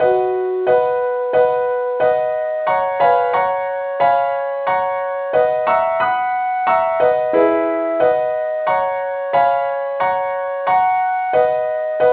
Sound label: leadsheet key b; SAINTTHOMAS